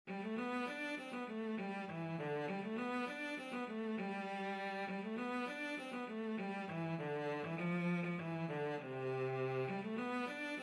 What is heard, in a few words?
Over The Waterfall, cello